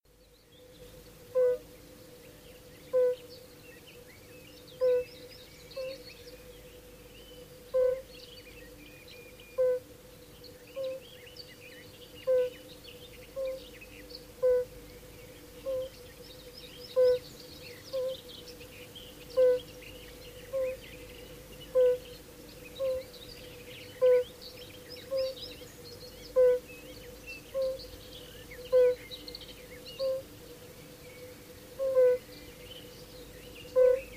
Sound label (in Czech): kunka obecna